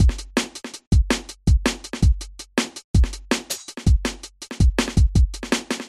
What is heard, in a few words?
beat